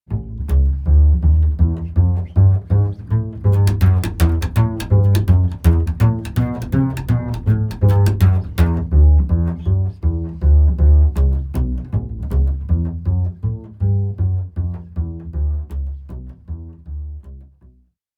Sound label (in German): Kontrabass